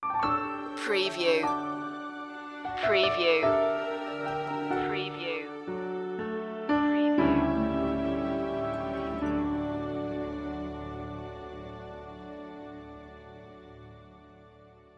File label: summer showers sting, magical